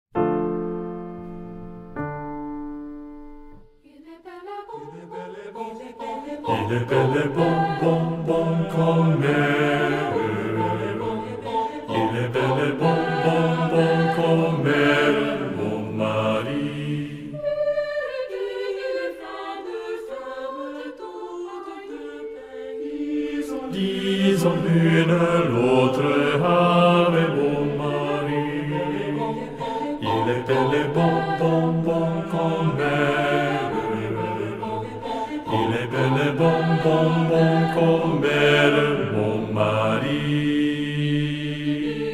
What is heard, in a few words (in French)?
Il est bel et bon bass